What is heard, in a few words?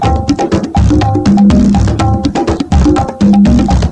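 rhythm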